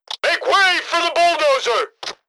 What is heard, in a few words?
bulldozer; garrysmod; weapons